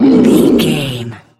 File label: troll monster battle groan